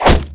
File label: impact; dota; enchantress